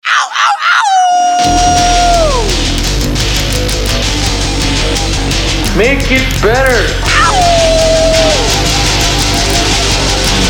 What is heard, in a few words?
RINGTONE